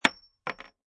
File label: Bullet Shell Drop Pistol Solid Wood, empty Pistol shell falls on solid wood; Impact Sound Effects